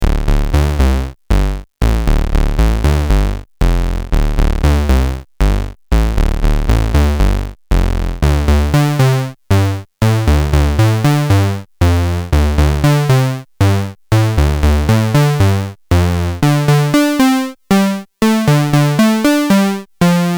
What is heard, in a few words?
VCA Lin Sat; WMD